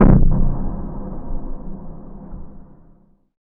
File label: LO-FI THUD, Impacts, Hits & Booms; Distored Reality